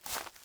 foliage step; teardown; ps5 windows xbox series xs

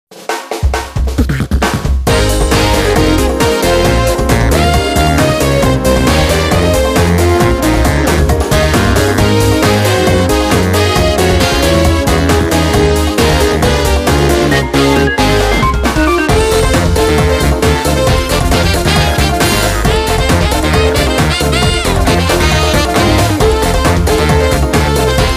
Music Success event

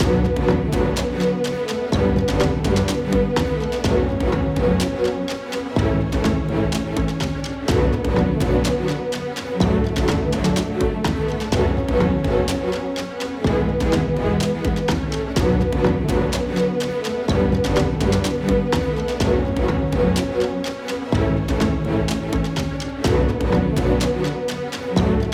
Menu Loop; skykeepers; ps4 windows xbox one gamerip 2017

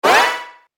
Match Connect Health; Godzilla Smash3

